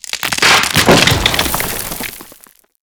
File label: wake statue, golem; quakemapping